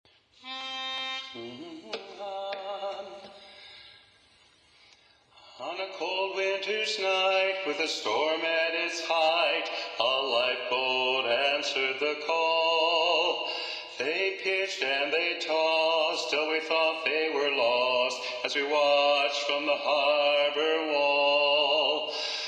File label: Bass Predominant, sea shanties